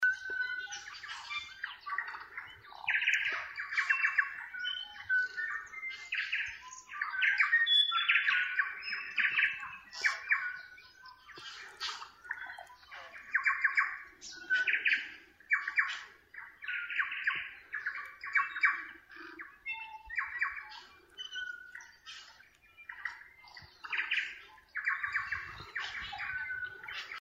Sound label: bird song